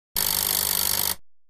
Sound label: telephone